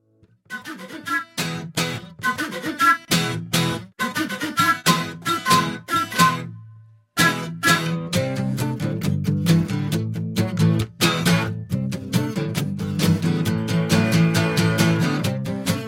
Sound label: Flutes